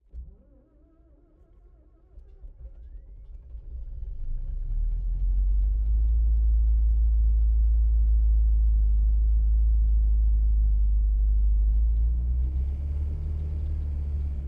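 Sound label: volvo pv4 onboard start slow drive stop interior, Volvo PV4 1929; DPA4021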